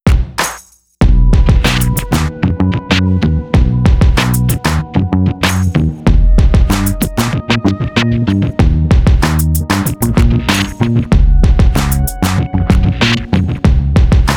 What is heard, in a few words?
Lime Beat, Backbeat